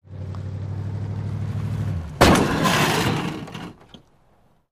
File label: Drop Impact Moving Vehicle Broadsides Stationary Vehicle, L To R, Great Metal Scrapes